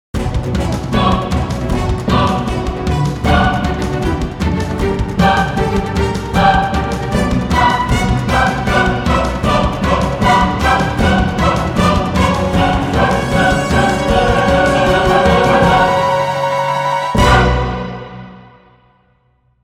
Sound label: epic